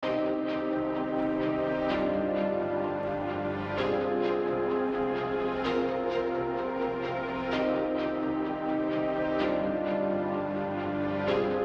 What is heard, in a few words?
POWERFUL IMPACT DRAMATIC EMOTIONS; game audio and cinematics